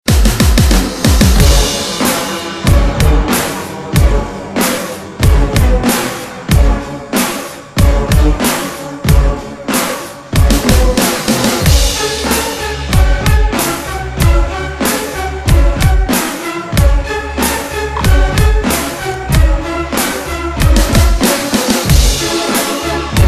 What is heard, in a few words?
Mass Rock CLUBMIX; Mankatha